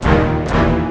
Violins; pitchglitch

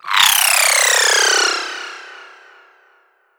Sound FX Hi Tech Lazer

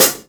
Hi Hat Closed

